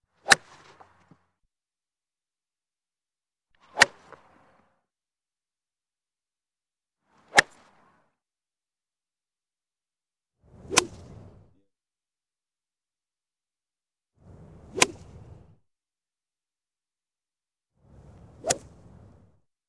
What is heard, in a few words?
Golf Swing; Sound Ideas 2000 Series